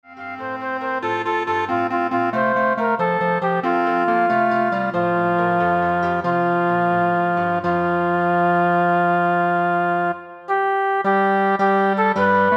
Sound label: Flute Clarinet